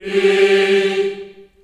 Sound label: G3, Choir; USB Soundscan vol.28 - Choir Acoustic & Synth; AKAI